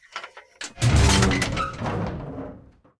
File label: cannon 380mm stumm mech, cannons tanks; War Thunder